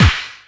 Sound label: KIT, Dance Mega Drums; Best Service